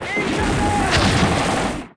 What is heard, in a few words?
open; PC Gamer IT CD 32